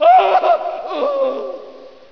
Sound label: fall; persia